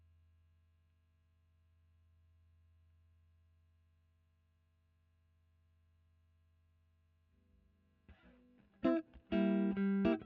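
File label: Electric guitar Royer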